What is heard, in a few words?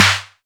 dirty snare single hit g key, snare drum sample tuned to the G note; snare drum samples